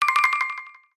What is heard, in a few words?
Messenger Jingle; GPSMAP H1